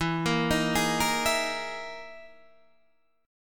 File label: E Major 7th Flat 5th down Guitar DADGAD